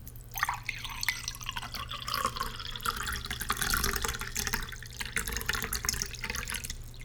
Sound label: glass of water